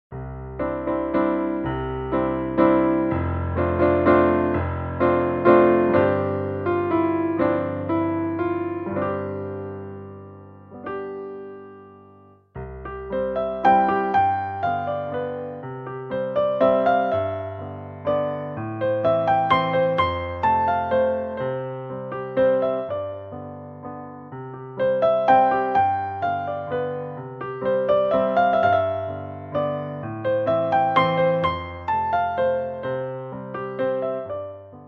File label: Lullaby for piccolo